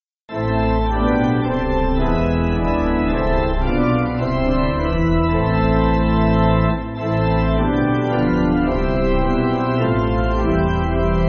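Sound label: Pipe Organ, Music Backing Files for Churches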